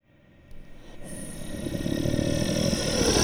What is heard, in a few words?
vortigaunt die